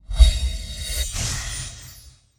move walking wild add; netent; narcos

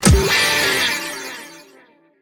pick n Click; netent

